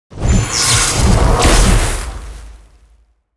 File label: Boss Lighting Horse; monster